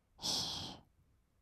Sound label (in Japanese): ブレス 吸う音 ひ; ASMR; 1200 kawaii voice!!